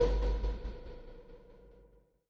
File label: error; efy sound effects